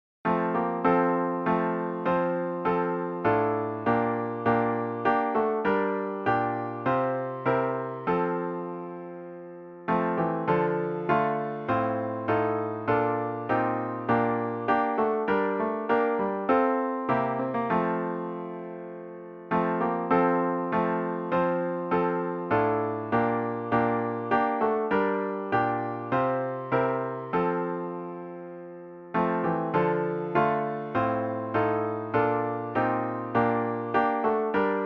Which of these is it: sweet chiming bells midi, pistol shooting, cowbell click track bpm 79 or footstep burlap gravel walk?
sweet chiming bells midi